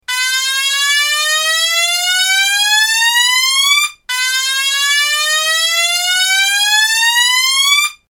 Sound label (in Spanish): tono